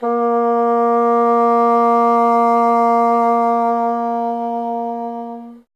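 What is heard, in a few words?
wind bassoon A; Miroslav Vitous; Solo Instruments VOL-1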